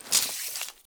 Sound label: Weapons, Bow, Grab Arrow, Drag, Pull, Bag Rustle; DeltaVR; FMOD; Objects Pickable